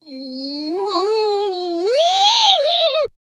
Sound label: sound effect, amazement